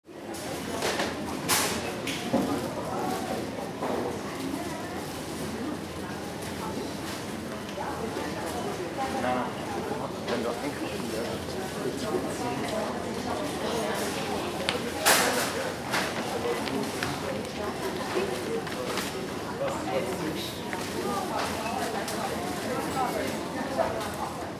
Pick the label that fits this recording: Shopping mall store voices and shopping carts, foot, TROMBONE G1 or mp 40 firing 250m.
Shopping mall store voices and shopping carts